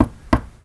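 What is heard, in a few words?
knock; NOKKU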